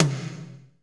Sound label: TOM, Drumscapes Roland